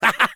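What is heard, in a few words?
laugh short, French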